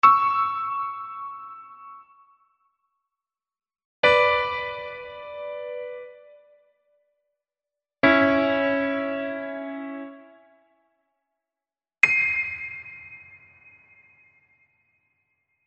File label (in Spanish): Ejercicios de Intervalos simultaneos de tercera menor